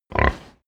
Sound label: pig grunt breath